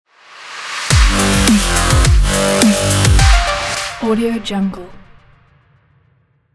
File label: corporate dubstep logo